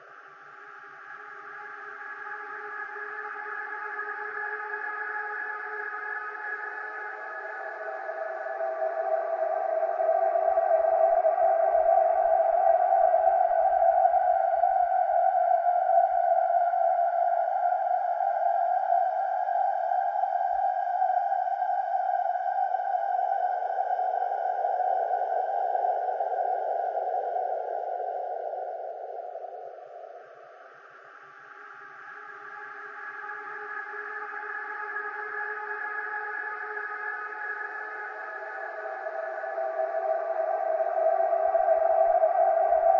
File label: Scream Ambient; those nights at rachels gamerip 2015